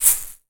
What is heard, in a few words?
Shaker Egg Shaker; Connersaw64